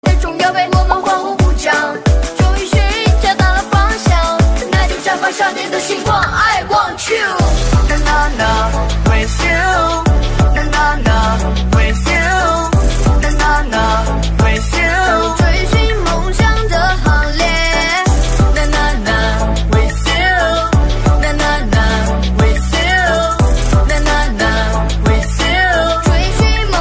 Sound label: can you guess hollywood ringtone